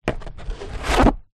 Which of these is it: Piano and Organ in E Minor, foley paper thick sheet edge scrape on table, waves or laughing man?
foley paper thick sheet edge scrape on table